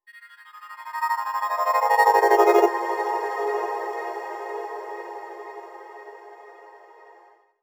cute transition

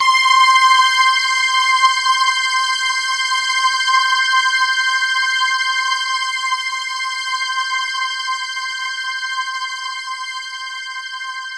C5, Roland JV 2080 CLUB ORGAN

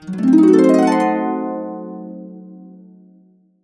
C DIM7, Harps; USB Soundscan vol.62; Complete Harps; AKAI

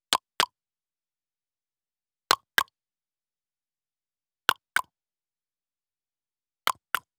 African Vocal Clicks; Continental Drift Aftershocks; Vocals

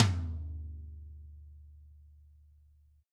DW, Double Platinum Drums; ILIO; Double Platinum Drums 1

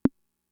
Conga, Fricke MFB-522

